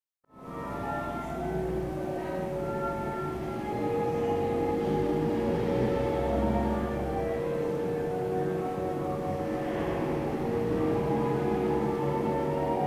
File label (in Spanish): paisaje sonoro; madrid